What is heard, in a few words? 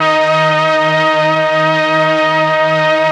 STR, STRING